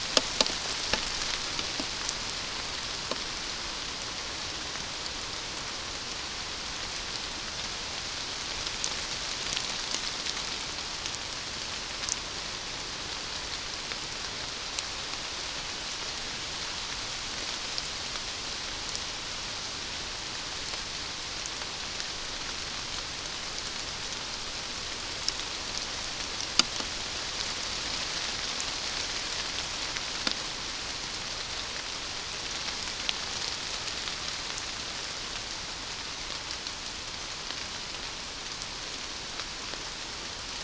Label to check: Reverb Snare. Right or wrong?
Wrong